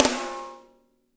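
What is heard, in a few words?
piccolo snare right, NATURAL KIT; Spectrasonics; Burning Grooves CD2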